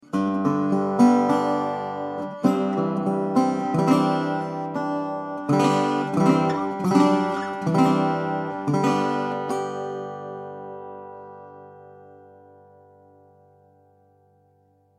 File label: Tricone after pick